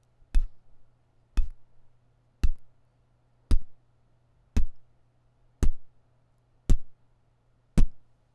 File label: hihat, beatbox